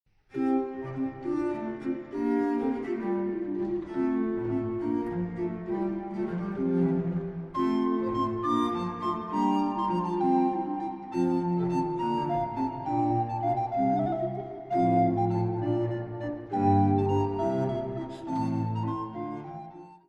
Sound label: Sonata a Fluto Solo e Basso continuo III Allegro; Sybrandt van Noordt